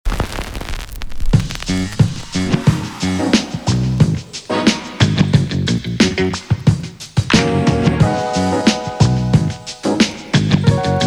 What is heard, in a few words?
HIPHOP